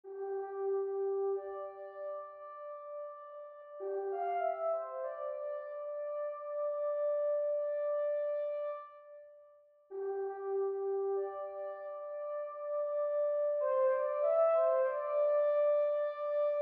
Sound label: Tenor Horn